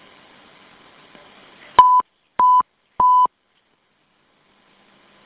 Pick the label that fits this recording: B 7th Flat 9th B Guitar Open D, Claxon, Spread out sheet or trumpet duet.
Claxon